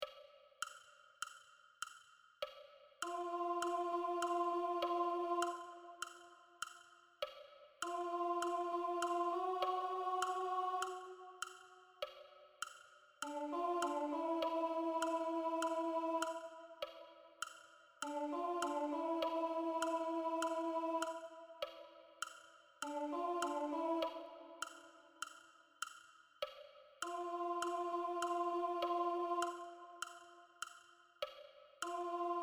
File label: Soprano, Gospel